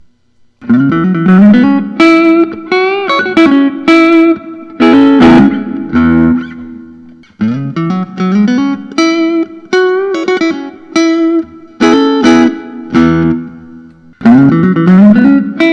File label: suhr modern t pro aged cherry burst